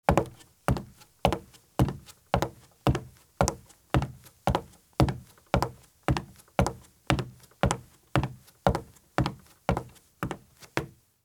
Light Running Shoes Walk at Very Fast Pace on Wood Porch or Footbridge; Sound Ideas; Footstep Sound Effects